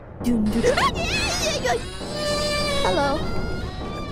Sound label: jumpscare; IRyScream